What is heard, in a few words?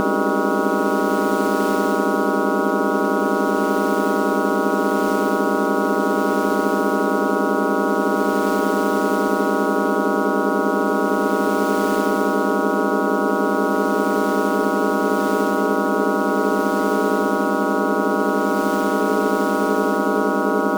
Solfeggio Frequencies